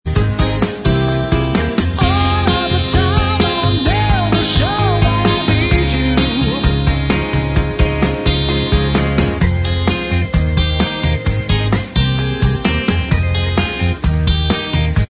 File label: in style of Bvox